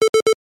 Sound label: Triple Bleep